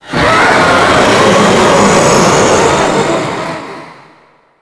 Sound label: Charge Scream; Warframe; Stalker